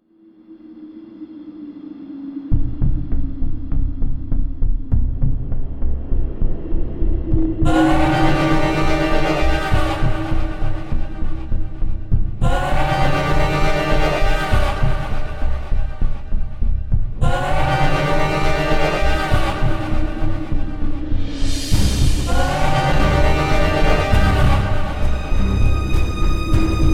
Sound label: Vampire Comeout, Tension 공포